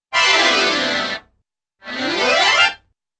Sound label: accordion slide down and up